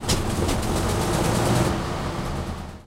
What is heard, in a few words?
interior engine switch, engines tanks; War Thunder